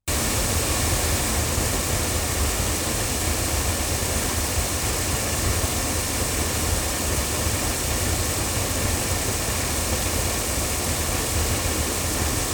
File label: Oktava MC012 cardioid loudspeakers